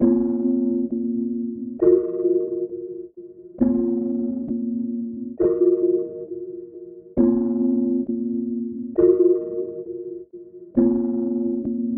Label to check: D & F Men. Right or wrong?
Wrong